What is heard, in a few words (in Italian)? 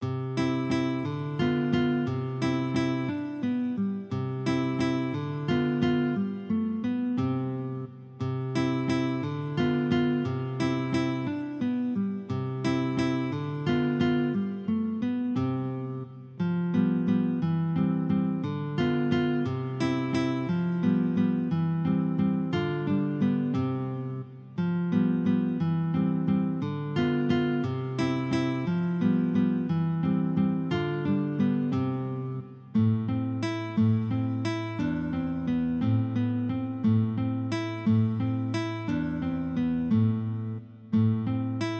VALZER; Carulli